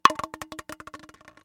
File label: plastic bottle concrete drop